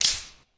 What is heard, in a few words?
medikit; ufoai; weapons